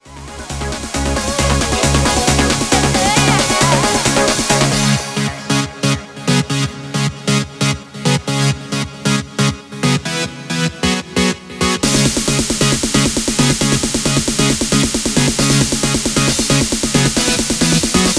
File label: Scottish Piano Close Proximity Trance Mix